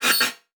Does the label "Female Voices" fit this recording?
No